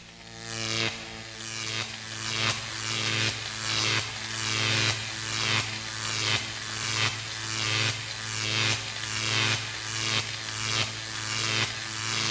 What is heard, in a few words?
electricity